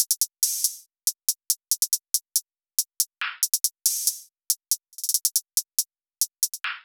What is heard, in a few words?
Hihat Loop - 140 BPM, Hip Hop; Cymatics; Heist